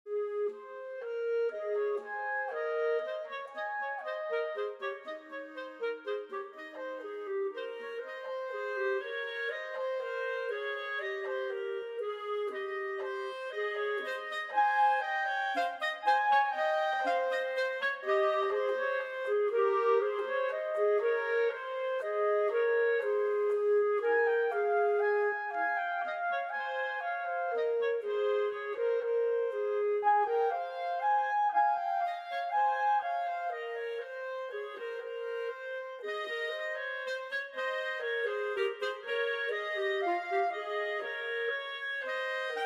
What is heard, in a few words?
Canon for two clarinets